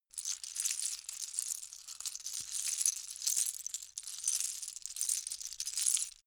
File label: lego bricks small handling sequence